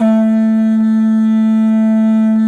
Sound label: A2 HORN R, Didgeridoo and Other Primitive Instruments; Big Fish Audio; DIDGEHORN1